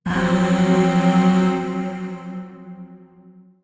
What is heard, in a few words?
Void Shawzin Hirajoshi Chord; Warframe; Zariman Void Shawzin